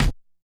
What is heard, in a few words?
kick, Hardcore Breakbeat; Big Fish Audio; Hardcore Breakbeat Action; Warned